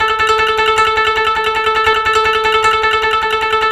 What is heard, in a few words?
Koto Tremolo A4